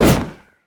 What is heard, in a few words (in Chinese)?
风弹爆发声